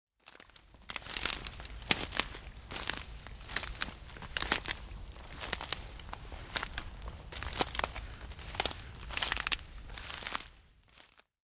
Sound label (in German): Winterklang